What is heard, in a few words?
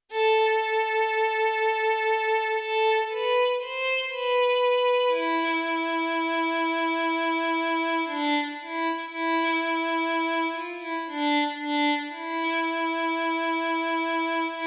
KEYBOARD